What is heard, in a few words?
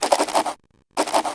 writing; belle